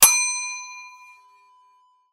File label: ding; Turkey Cooking Simulator; GUI